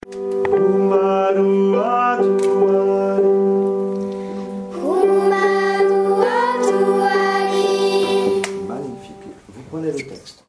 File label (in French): apprentissage fragment hymne ugarit fragment